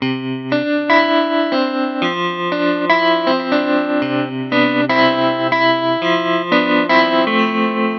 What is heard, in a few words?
guitar